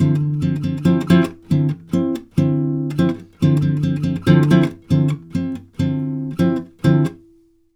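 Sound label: 140 guitar D minor 7, Brazil Bossa; USB Soundscan; AKAI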